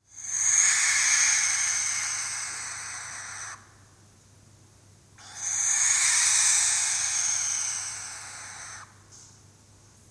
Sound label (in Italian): barbagianni; 5 barbagianni; I 7 CANTI